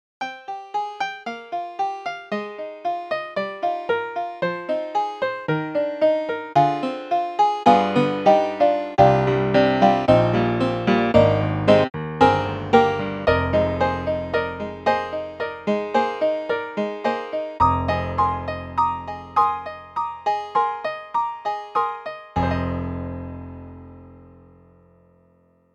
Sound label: Bright Grand; VI ONE